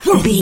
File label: voice male attack